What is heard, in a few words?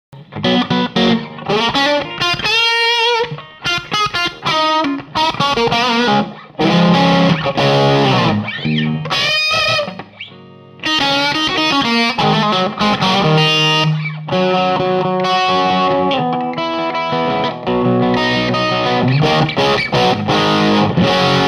traynor SM57